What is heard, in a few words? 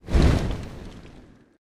phoenix rebirth flap; smite; npc